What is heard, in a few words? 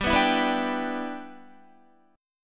G 6th, Guitar; G6 strummed; 14,14,16,16,14,15